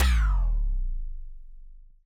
TD-20 Tom B, Roland TD-20 Toms

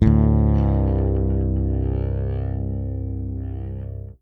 FRETSLIDE, PEDULLA BASS; Masterbits CLIMAX 7 - Bass

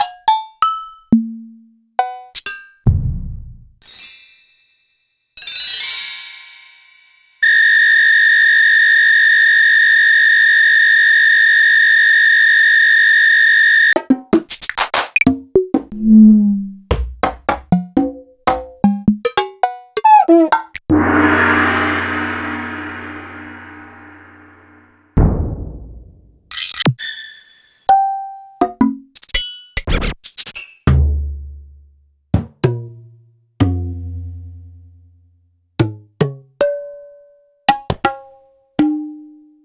Yamaha DTXpress Percussion; Sliced

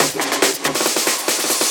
Fills, Loops 140 BPM

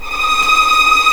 D#6 TREM, VLNS TREMOLO, String; East West; The Ultimate String Collection

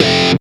METAL POWER; East West; Global Sounds

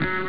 dance piano; ST-XX Amiga Soundtracker Sample Packs

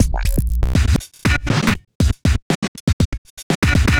Robot Thunder, Modular Electronica

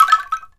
ANKLUNG; ILIO; Synclavier Percussion 2; World & Orchestral

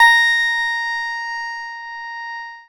PLUCKED, Synclav FM, A#; Big Fish Audio

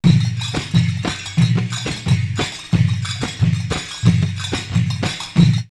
Djinn 90, drumloops World Ethnic; X-Static Goldmine

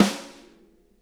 snare, drum kits

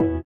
SITAR; Spectrasonics; Metamorphosis; INDIATEK

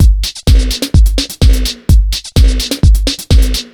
127 BEAT, Disco House; USB Soundscan vol.20; Fresh Disco House I; AKAI

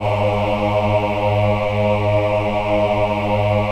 VIB G#2, VIBRATO, Voices; Spectrasonics

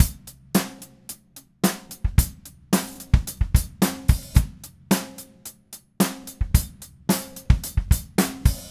Red Maple Groove 110, DW Red Maple Kit

